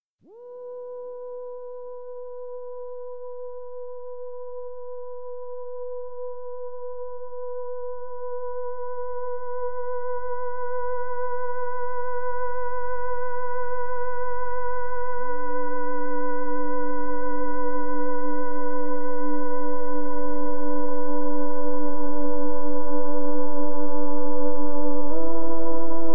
Intro Modular

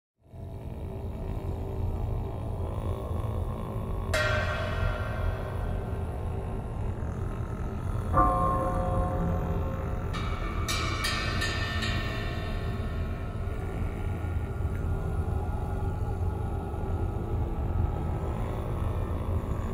horror trailer music epic intense horror music

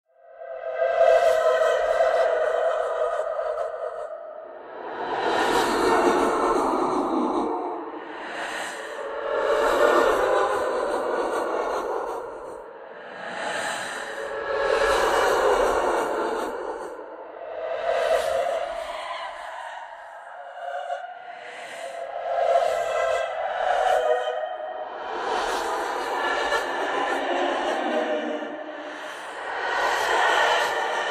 ghost woman crying scary horror sound effect

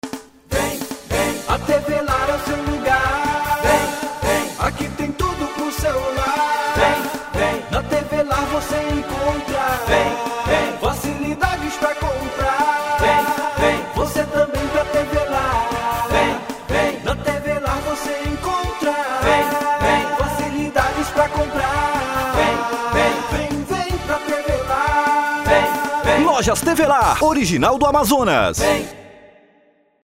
jingle; tv lar vem

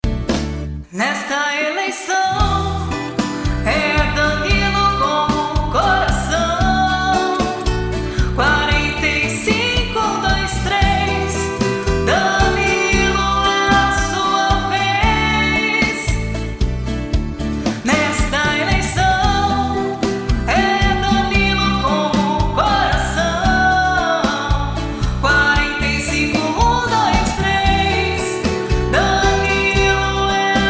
jingle

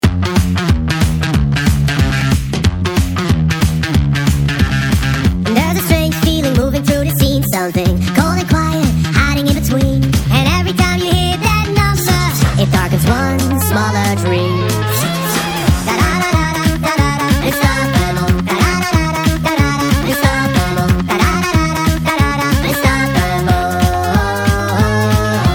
sped up

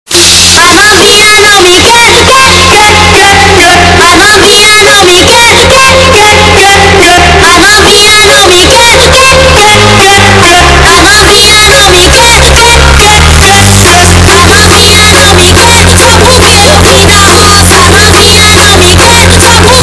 festival trap remix

